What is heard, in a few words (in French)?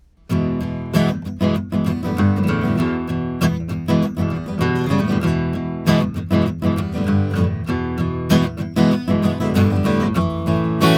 guit droit; pendulum; quartet